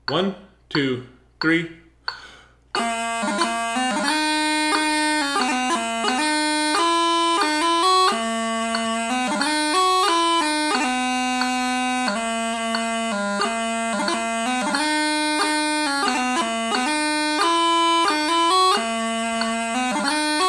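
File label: 90bpm, Chanter Recording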